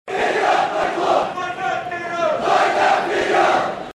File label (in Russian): Звук спартак вперед